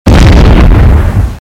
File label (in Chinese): 爆炸音效